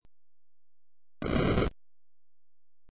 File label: fire